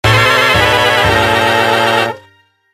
Lose Prize; Dumb Ways to Die 2 The Games; Android

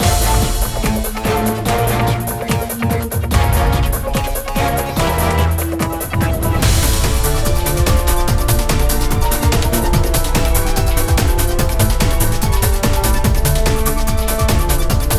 LOOP Mission; Adventure Land

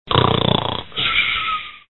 snore sound

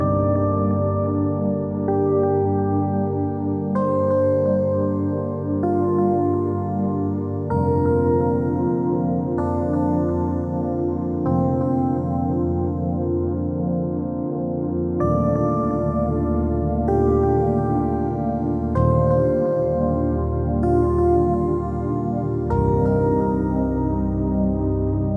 talkie sad (loop); super crush k.o.

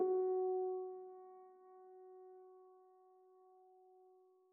City Reprise; Forest SFX

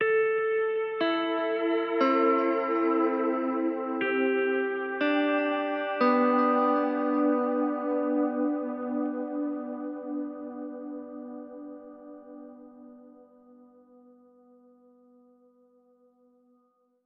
guitar; Void Bastards; Krell

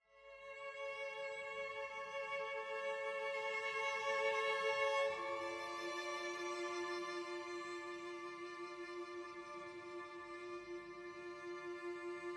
Explore Day Stringlines

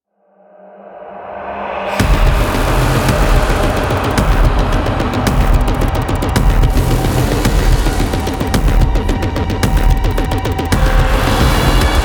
Music Main Ambient; Dlc2 Tech Hell Music Main Ambient 5

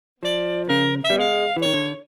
Lose; Pinguinos Biri Game; Android

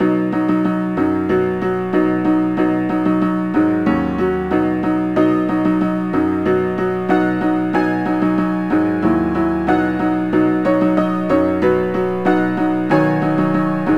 Dance Rumba Medium; Knigge Spielend zum guten Benehmen; NDS

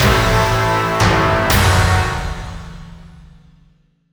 Game Over Light; Citadels

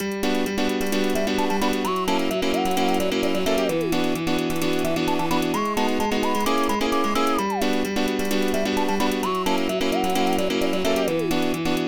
Instrumental; Friday Night Funkin Funkin Peanuts

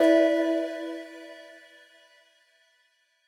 Clear Em1; Mixolumia

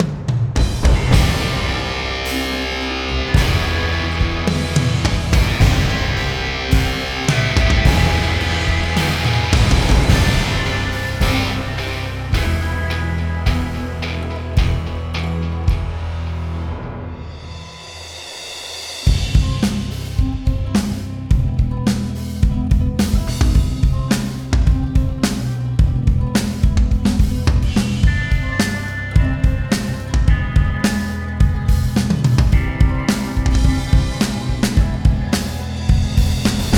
Boss Celebration; Chillheim

